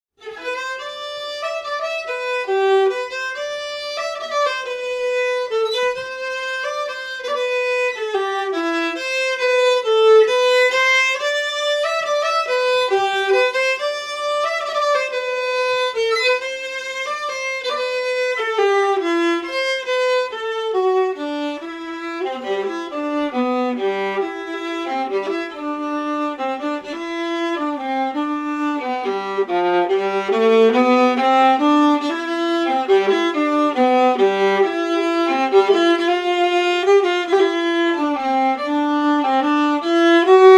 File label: West to the Tiers, Melody, Viola, Waltz